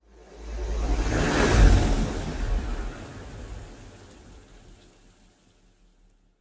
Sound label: ship destroyer move; Stellaris; machineage; ships